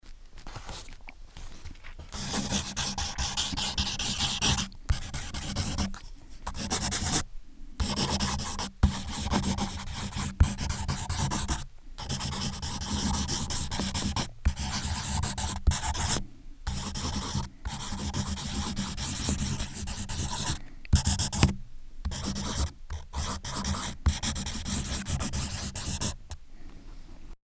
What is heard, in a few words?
writing